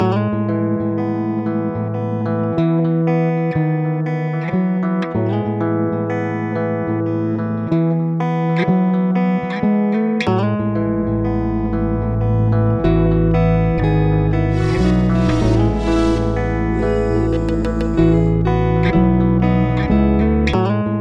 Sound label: 187bpm E maj